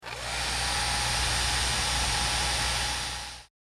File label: dryer; ドライヤーの音